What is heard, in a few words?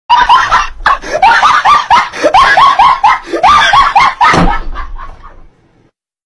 dog laugh